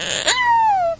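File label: squeak of a cartoon character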